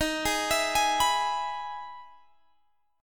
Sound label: E Major 7th D sharp, chord